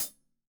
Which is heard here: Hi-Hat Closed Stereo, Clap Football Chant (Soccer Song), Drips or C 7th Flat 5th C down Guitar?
Hi-Hat Closed Stereo